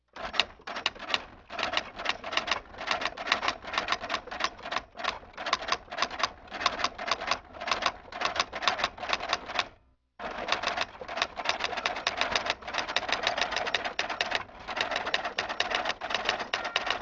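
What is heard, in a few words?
typewriter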